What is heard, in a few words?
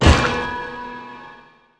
Clang